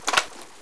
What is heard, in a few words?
ump45 grip, weapons